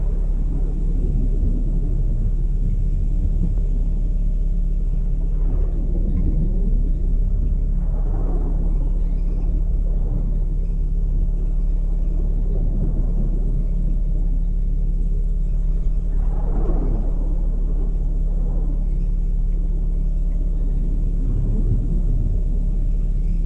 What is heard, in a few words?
HYDRO THUNDER; Ambient Audio